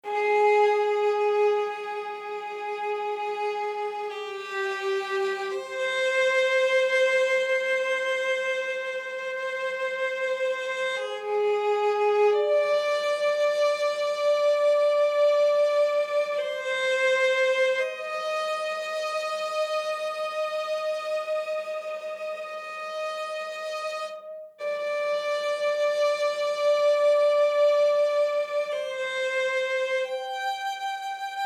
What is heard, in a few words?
Emotional (Loop)